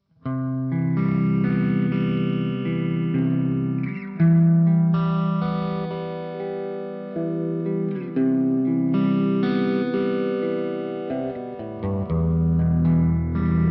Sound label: TwinR Overdrive Phaser Reverb, guitar; TwinR – SHOD Overdrive – P90 Phaser; ReTube Custom Shop